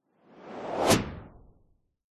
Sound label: sound design whoosh very fast